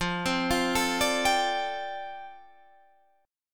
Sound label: F 6th Add 9th F down, chord